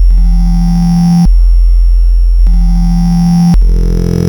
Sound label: Retro Echoes; Ableton Live 12 Lite; Vector; Sprites